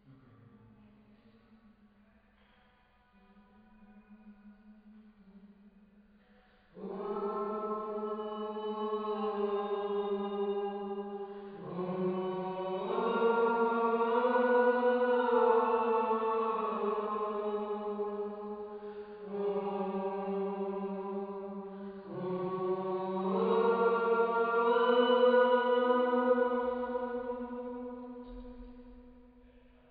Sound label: Choir